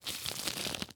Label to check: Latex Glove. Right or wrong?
Right